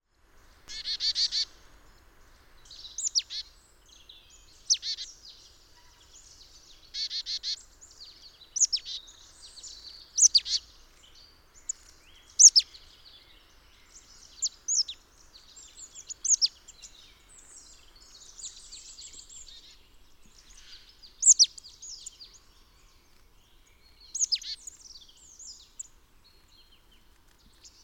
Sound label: Poecile palustris; SETE